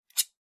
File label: clippers snip; garden shears